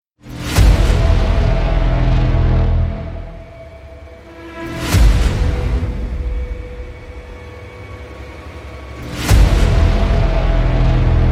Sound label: Epic Music instrumental